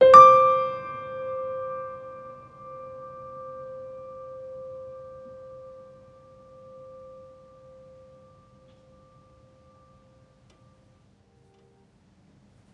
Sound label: Music Level Fight Stinger; Florence